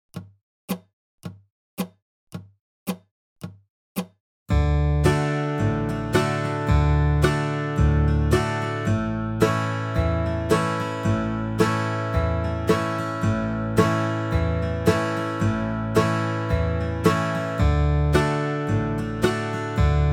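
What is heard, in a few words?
Key of D 55 BPM, Dobro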